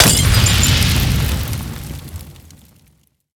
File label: explosion molotov